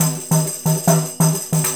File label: Tambourine